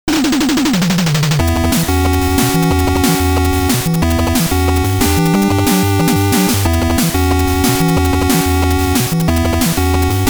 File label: Boss Battle; Widget; NES